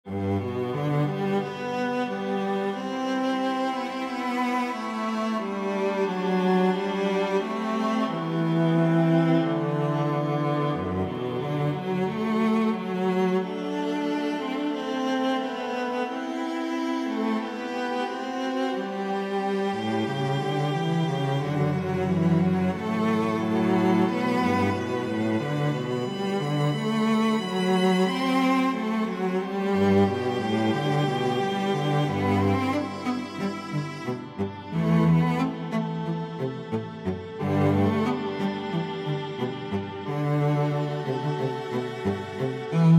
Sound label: cello alto one